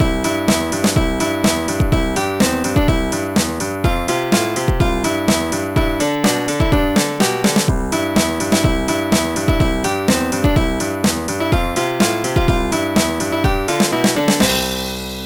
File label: jinglebell; Big Red Adventure